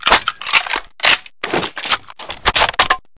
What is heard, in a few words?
reload sniper